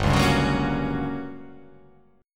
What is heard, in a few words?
G Minor Major 7th Flat 5th G